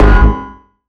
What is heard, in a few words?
Bass F, Bass Synth